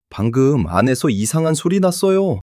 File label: surprise